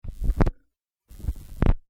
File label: musical vinyl clean stylus, Turntable Stylus Being Cleaned; Turntable Needle / Stylus Being Cleaned - Electrical Signal Heard Through Output; DJ; McKinney Sound